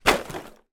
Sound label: impact box full of plastic light items kick or drop